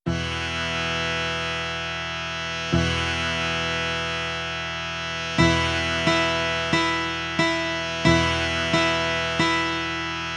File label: accomp